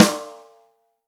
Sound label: ROCK SNARE CHROMATIC, Real Drum Kits